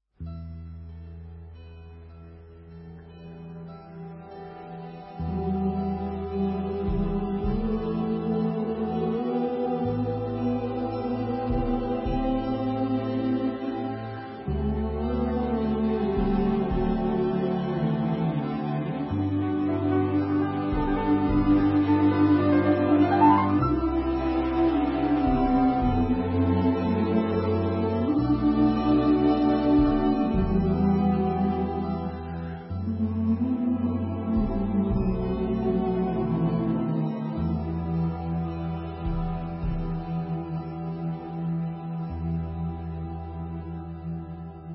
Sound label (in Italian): meditazione melodica